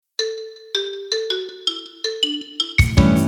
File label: xylophone